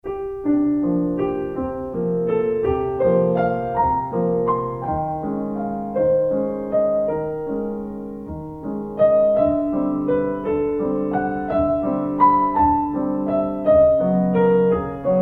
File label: Waltz